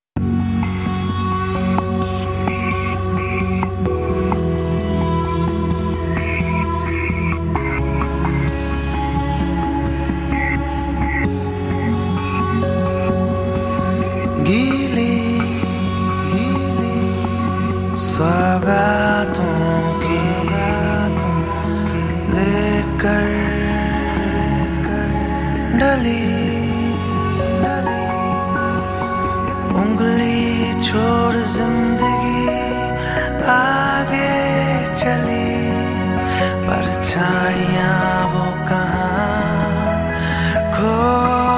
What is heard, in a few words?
(Sad Version); Club 60